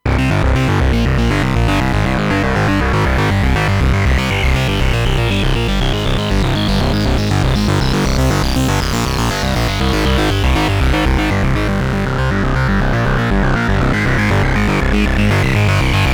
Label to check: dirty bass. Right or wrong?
Right